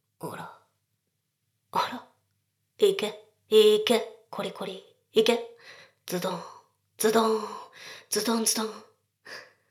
しこしこ; ASMR; ダウナー風俗

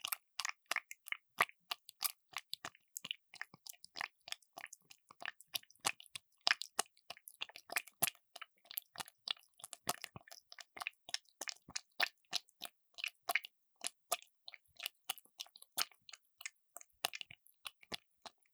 クチュ音 ノーマル～高速ピストン

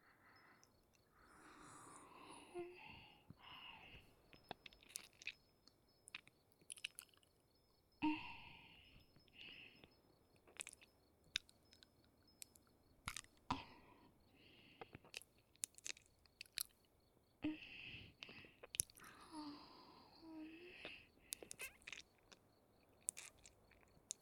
ちょっと休憩…？（右耳舐め）

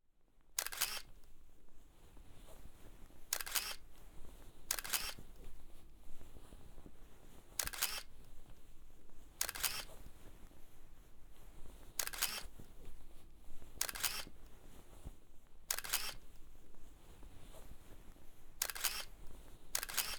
足コキ ASMR; かわいい私の足コキファンサービス

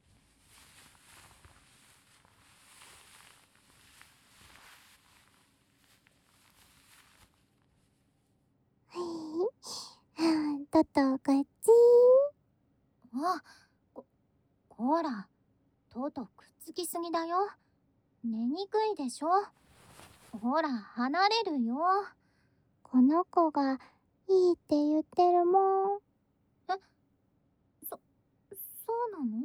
川の字添い寝; 親子３人と

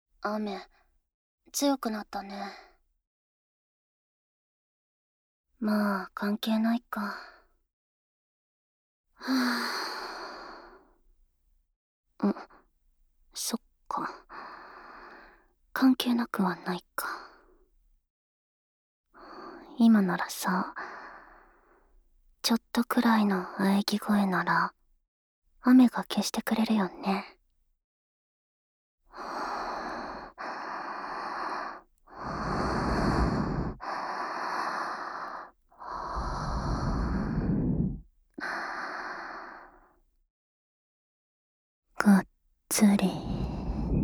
雨と喘ぎ声; SE無しver; ASMR

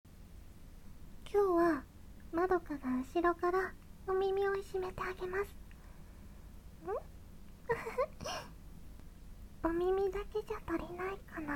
耳舐めASMR

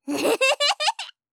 笑い(攻撃); バトル系

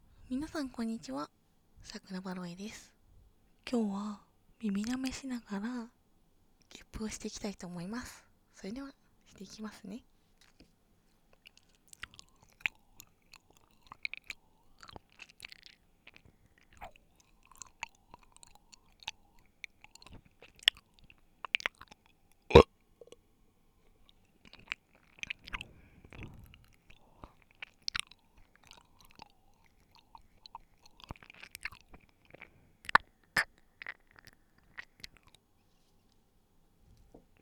耳舐め ゲップ

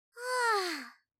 ふぁあ, 相づち・反応系【ポジティブ】

